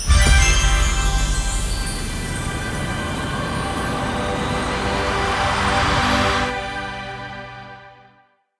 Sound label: near win riser